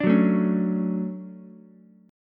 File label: D-Major 7th, D, Ukulele; strummed; JGuitar